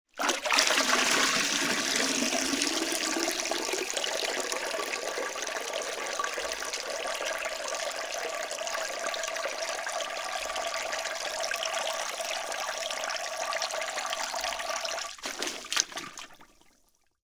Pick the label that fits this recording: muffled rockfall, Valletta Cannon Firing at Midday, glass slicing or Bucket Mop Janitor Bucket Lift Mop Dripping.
Bucket Mop Janitor Bucket Lift Mop Dripping